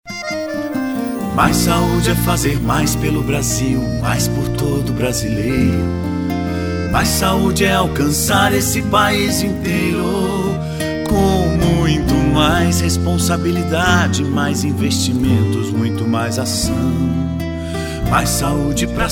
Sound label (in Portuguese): jingle mais saude